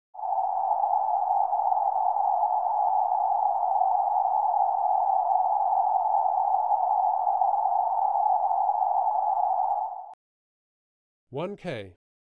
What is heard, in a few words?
800Hz; dbx test